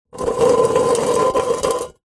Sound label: tin metal cylinder lid on scrape on concrete; foley; zapsplat